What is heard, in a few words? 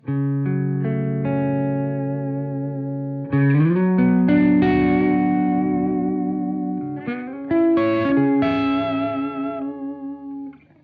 FUZZ FELLA BLUE BC108C Strat Style Guitar; CHASE TONE; SAM VILO